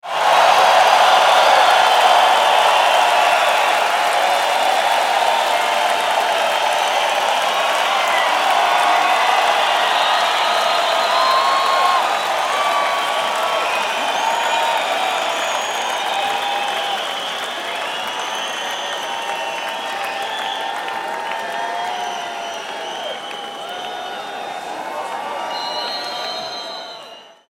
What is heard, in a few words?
Crowd at concert sound effect